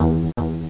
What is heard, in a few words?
Mothership Background; Bedlam 2 1.1.1